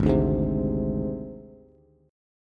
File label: Ab Major Flat 5th Ab Bass; AbMb5 strummed; JGuitar